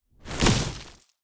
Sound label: stone golem little strike; Shelter